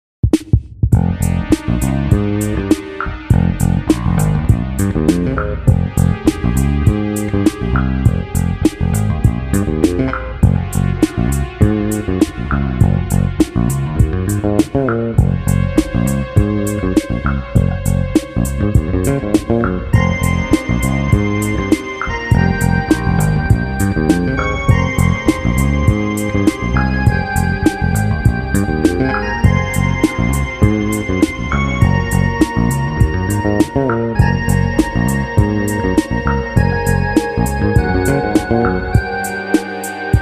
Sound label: fretless funk; weeklybeats 2024